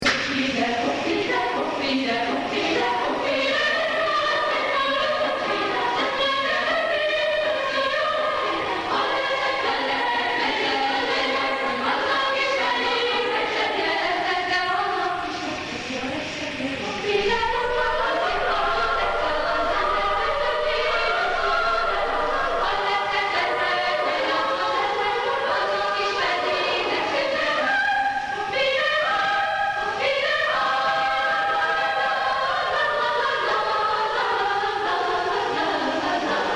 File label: Evening Singing